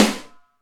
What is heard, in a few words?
Snare, Alesis DM5 Drum Machines